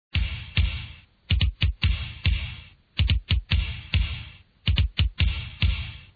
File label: Drums